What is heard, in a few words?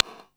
hi-percussion, techno; Techno ID; single-drums